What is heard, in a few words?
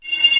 cylon, Electronic Hits, drums single hits